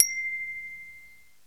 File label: COOL BELL; Crypton - DrumBase5000; COOL PERCS